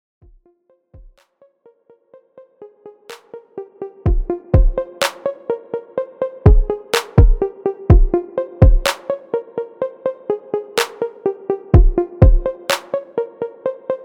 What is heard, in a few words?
Funky; Funky Friday